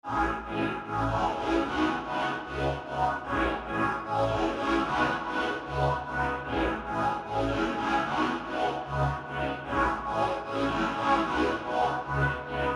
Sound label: G min 150 BPM Vocals